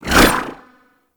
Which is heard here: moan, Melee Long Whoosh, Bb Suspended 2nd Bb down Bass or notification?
Melee Long Whoosh